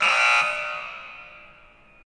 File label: vote ends; Snaparazzi